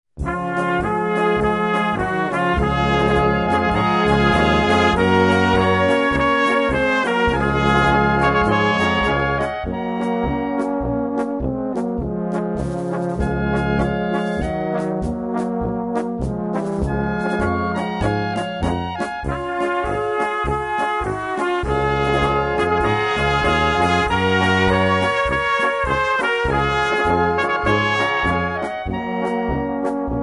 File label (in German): Polka